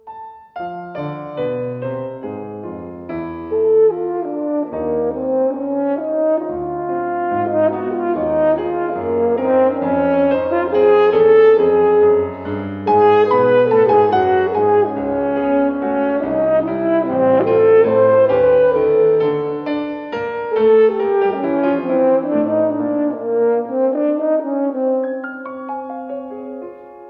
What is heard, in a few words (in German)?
Ballade für Horn und Klavier